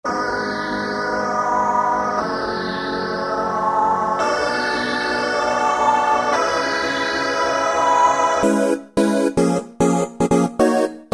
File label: Rave Vox, Korg N364; Factory Combi demos